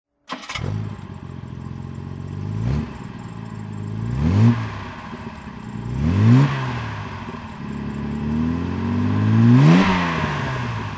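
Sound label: jag E type 38ots throttle, Engine sound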